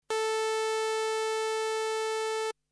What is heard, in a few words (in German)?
Grundsound; virusc